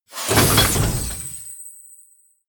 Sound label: sci-fi plasma cannon load